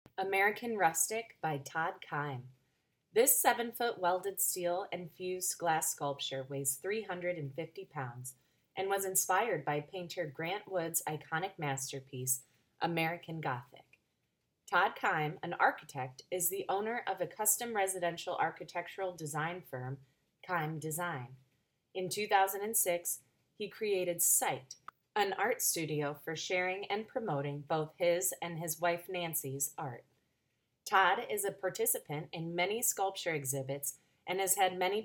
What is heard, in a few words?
american rustic